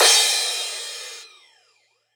Crash, Cymbal

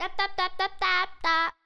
Monks, Vocal; Loops; Tonal; Kyathe; Ableton Live 12 Lite; Core Library

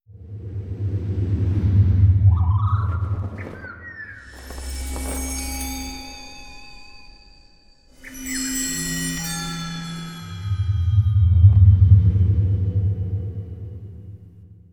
Desert Side Painting; Journey

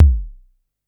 Tom; Ableton Live 12 Lite; Core Library; Drums

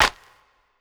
Snare, drums one shots; Celebrate

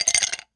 FX Ice Cubes; FX Hit; Drums; Ableton Live 12 Lite; Core Library

